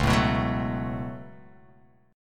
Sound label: C sharp 7th Suspended 4th Sharp 5th